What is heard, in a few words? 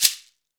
Latin Shakers, shekere